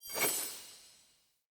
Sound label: Player Short Sword Recall Local; Weapons; Swords